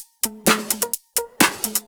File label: Electro Loop 128BPM; Vengeance Samples